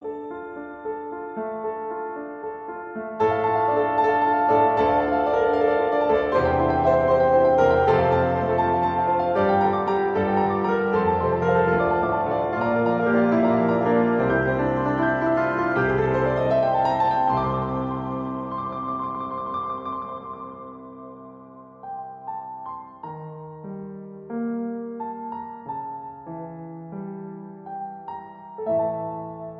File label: Piano Duet